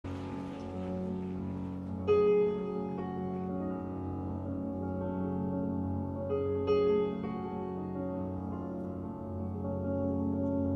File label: Piano Thing interlude